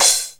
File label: SPLASH, CYMBALS; Back In Time Records - Big Bang